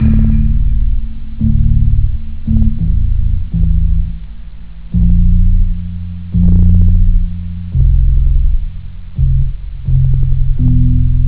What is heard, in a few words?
dance; OctaMED SoundStudio V1